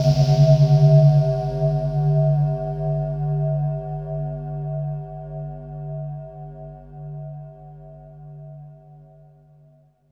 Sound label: RING GONG; Various FX & Atmo; Distored Reality 1&2